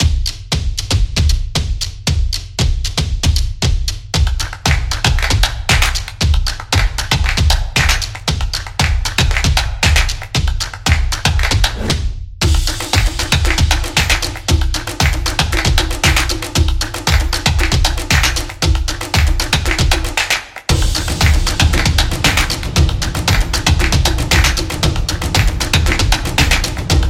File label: A Dynamic